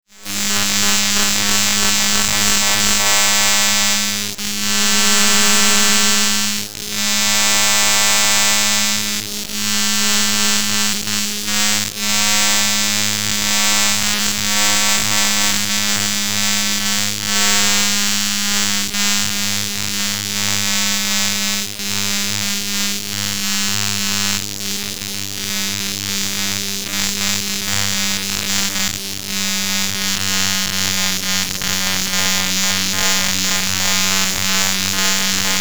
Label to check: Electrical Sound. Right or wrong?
Right